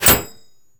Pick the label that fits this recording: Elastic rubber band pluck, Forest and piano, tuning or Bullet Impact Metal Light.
Bullet Impact Metal Light